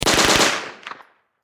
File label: Warfare Submachine Gun Mp40 German 9Mm Short Burst Distant Perspective